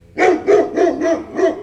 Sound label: dog bark close; Counter Strike Model Pack; CS:GO